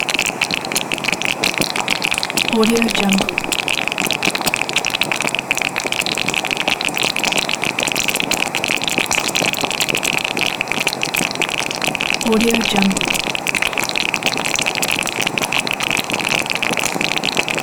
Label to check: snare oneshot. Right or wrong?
Wrong